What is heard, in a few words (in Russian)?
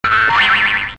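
мультяшный звук вибрации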